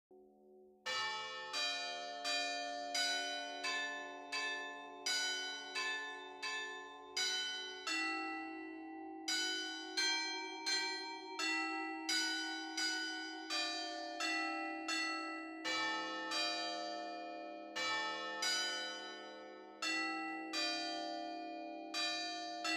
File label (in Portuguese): Sinos; Ave Fatima